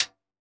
Timbal Paila; Roland BK-5; Percussion; Timbales

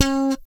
POP D 5, bass; Spectrasonics; Bass Legends

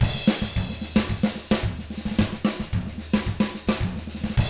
110 SAMBA, Drums; ILIO; Double Platinum Drums 1

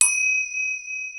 R8 ETHNIC perc; Rubber Chicken Software Sample Bank; DRUMS + PERC

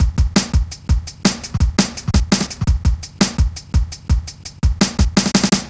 Step Ez, Drum Loops, Jungle, 168.5

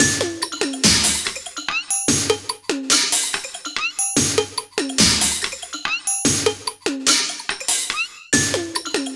144 ELEC LP 4, Industrial Loops

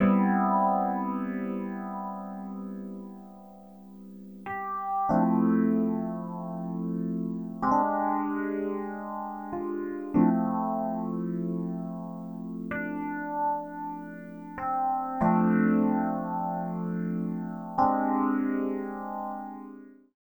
Hip Hop and R&B; Best Service ProSamples vol.02; AKAI

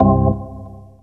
orgles; Sonic Foundry (Sony Creative Software); Synchro Funk; One Shot Sonics

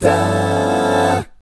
G13B5, GUY CHORDS, Vocal, Jazz; ILIO; Vocal Planet VOL-3; Jazz & FX

